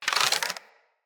Bow Charge Power; Weapons; Player; Bows; Fey Thorn Bow

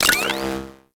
Proj Hit; Warframe; Venus; Venus Commander Orange; Abilities

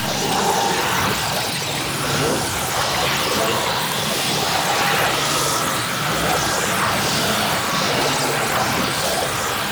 Stalker Scythe Proj Loop; Stalker Jade Scythe Proj Loop; Warframe; Weapons

